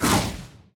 enemy warlock ranged cast

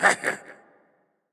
enemy specter interact cast

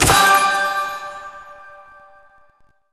achievement collect

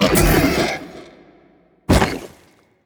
boss death; goregrind

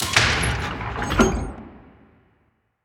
halloween vampire boss gates

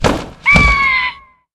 gryphon takeoff

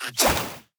enemy acid basic attack

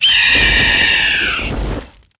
Phoenix death

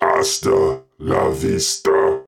voice warhead death

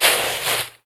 tree leaves

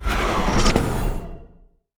astral Reflection; heroes therien

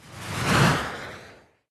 boss shadow storm travel; bittering rancor

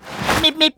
roadrunner meep meep

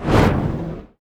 heroes basic Attack cast; lumenir

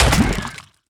enemy corrupted stalker death; sfx

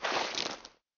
Tower Sell

